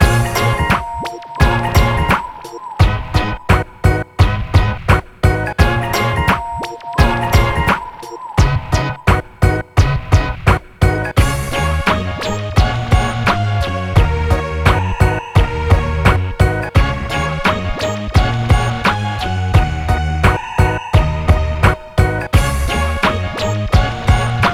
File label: Bpm86 Loop R; Pepo